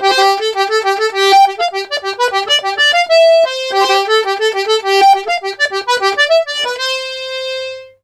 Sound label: BOUREE, Accordions; USB Soundscan vol.40; AKAI